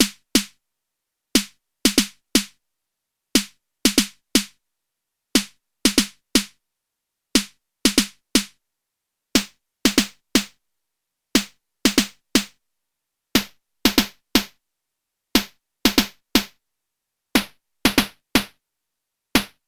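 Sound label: ALM Squid Sample Quality Rate SNR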